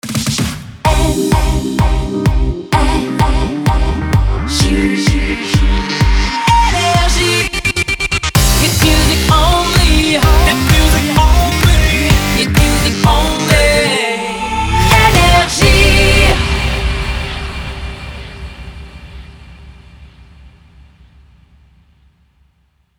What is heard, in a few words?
jingle; NRJ